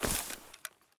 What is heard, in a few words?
grass, step; Soundscape Overhaul